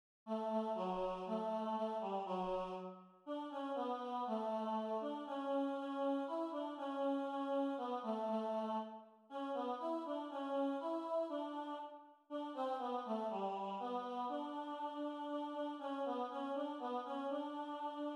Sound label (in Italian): Ninna Nanna Brahms Tenore; Ninna Nanna di Bramhs